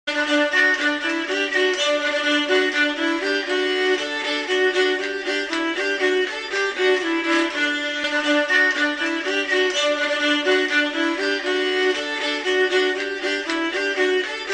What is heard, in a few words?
Scandinavian Moraharpa